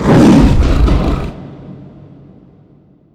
leap; weapons; dota; mirana

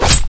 attack; antimage

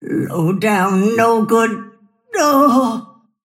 death; snapfire

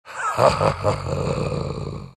growl; bloodseeker

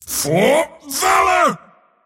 attack pain; dota; mars

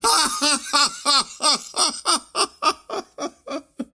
wheel laugh; ringmaster

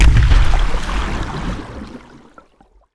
pounce impact; weapons; dota; slark

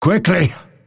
move pain; dota; phantom assassin

